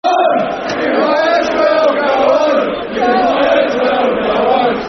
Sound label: Go Home, Football Chants; Queens Park Rangers Qpr